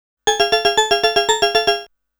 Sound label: Monster Spurt Warning MUSIC; Namco Classic Collection Vol.2; arcade soundtrack